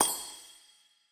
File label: Clap; ChibiMita; MiSide